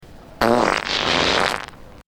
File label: fart prank sound